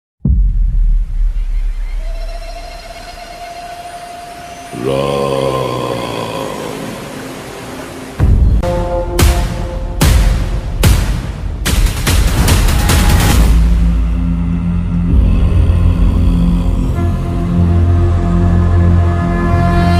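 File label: background music ringtone; ram; hanuman movie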